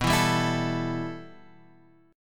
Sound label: B 7th Suspended 4th Sharp 5th B; B7sus4#5 Chord {7 7 5 X 5 5} strummed